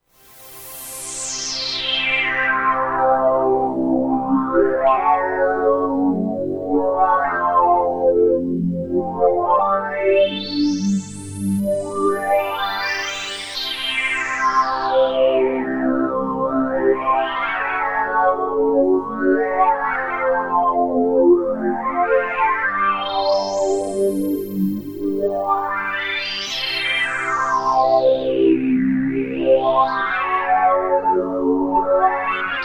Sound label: Weird Synth; Ambient FX